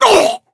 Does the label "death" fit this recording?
Yes